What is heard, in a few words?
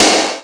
Snare Ambi, Korg 05R-W Korg Snares; 300 Drum Machines